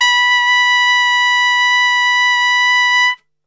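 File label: B 2 piccolo trumpet, Brass; Best Service; Brass Super Section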